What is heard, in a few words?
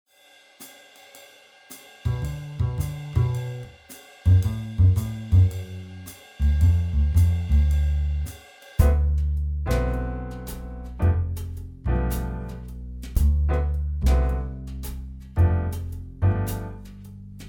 Swing Swing, instrumental; rapidea records